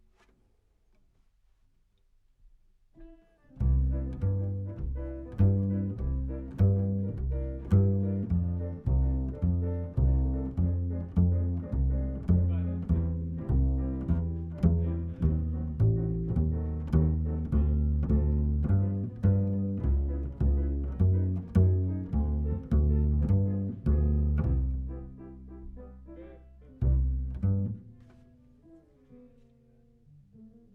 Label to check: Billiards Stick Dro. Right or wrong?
Wrong